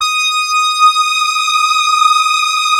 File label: FAT LEAD, Powered DX; Wizoo